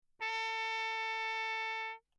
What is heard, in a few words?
TRP MUTE Bach Crown Alu; Perinétventiltrompete; Mutes